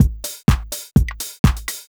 HOUSE BEATS 125

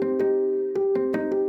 ethno, 160, C

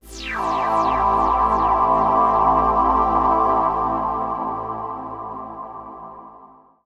TECHNO PAD 140; Keys

